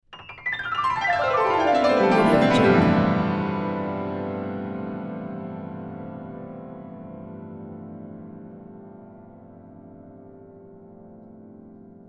two hand grand piano descending gliss

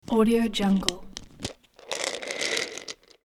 unscrewing bottle top and emptying tablets

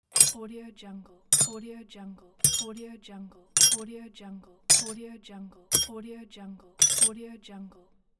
drop tool

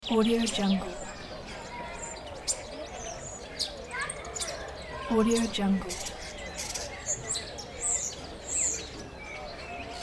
farm ducks birds turkeys people talks distant

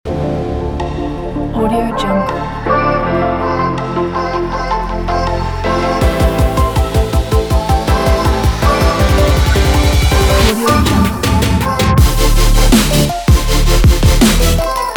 future bass

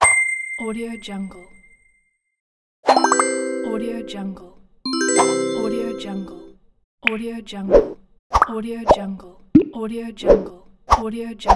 motion graphics sound pack

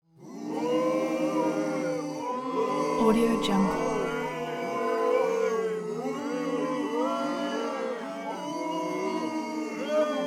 human crowd boo long; smartsoundfx

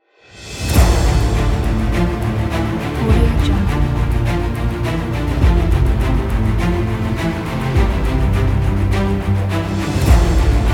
epic trailer

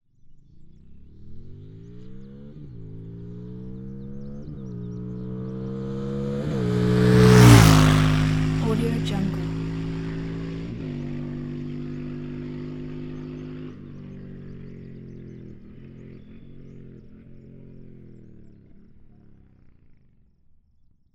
motorcycle passing by; lake3sound

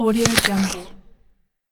eating apple

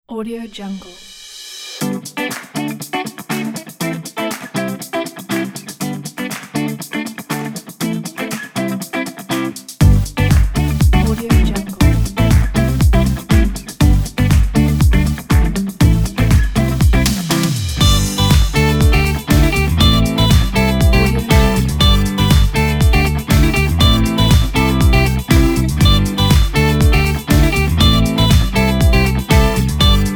positive upbeat

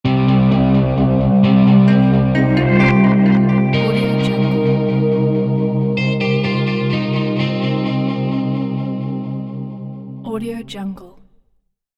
wild desert logo; magicsoundflower

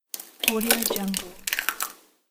huge egg crack open

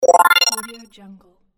health charge

